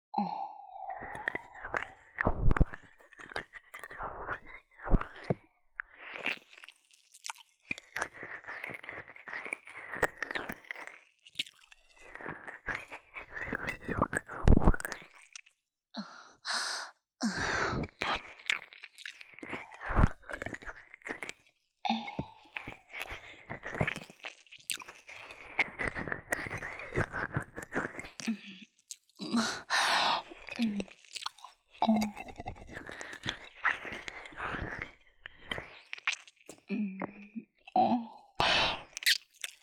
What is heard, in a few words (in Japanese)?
清楚お姉さん耳舐めオンリートラック; 豪華特典